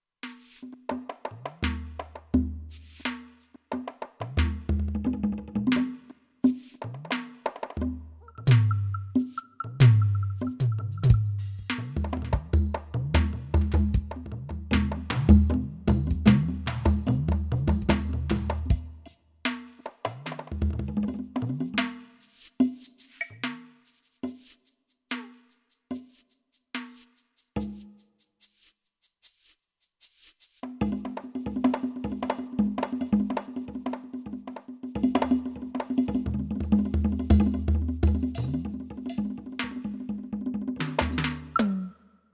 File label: Perc Section MW, Kurzweil K2500X; Factory Programs